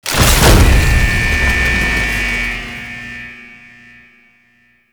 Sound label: Elevator Start; Gameplay; Warframe; Jade Shadows; Ascension